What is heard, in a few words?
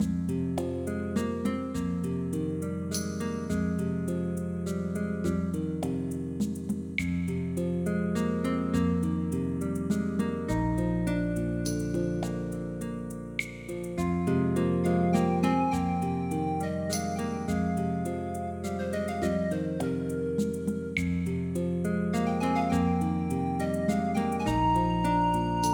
Market (midi rip, softened)